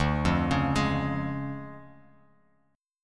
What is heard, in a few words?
D Minor Major 7th Flat 5th D Bass Standard; 10,8,11,13; DmM7b5 arpeggiated